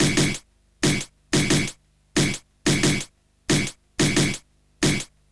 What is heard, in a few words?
Kick Snare Loop